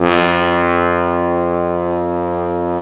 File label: tuba F2; Star Trek JR Audio